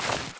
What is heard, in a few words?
tommy mag punch